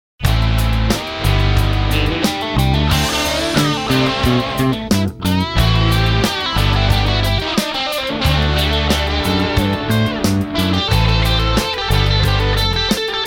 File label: guitar solo